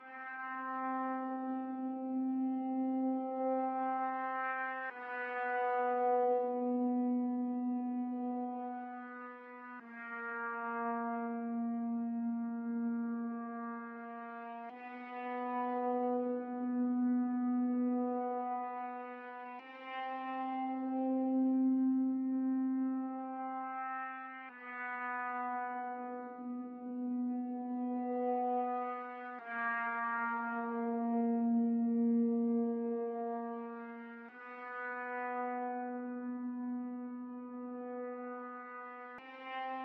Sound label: pendulum loop